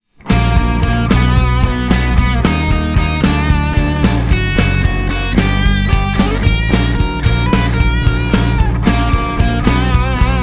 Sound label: augmented mode